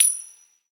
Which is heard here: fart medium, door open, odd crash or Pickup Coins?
Pickup Coins